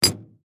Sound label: Metal padlock set down on wood surface